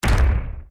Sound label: totemgolem move